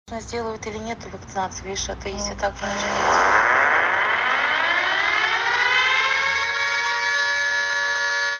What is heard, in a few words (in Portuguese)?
ALARME TOCANDO